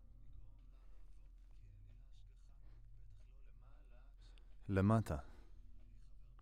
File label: Voc OD